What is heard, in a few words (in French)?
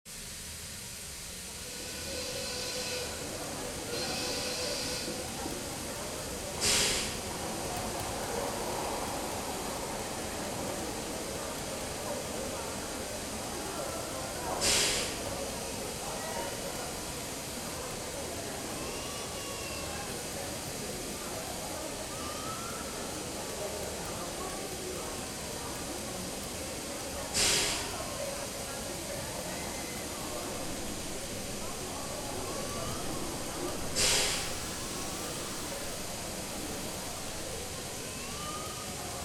ambiances gare de la seconde guerre mondiale ww2 train station